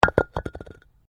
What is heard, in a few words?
PAVING STONE FALL